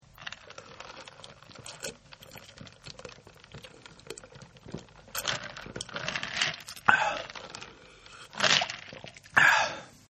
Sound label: Drinking; Human; Partners In Rhyme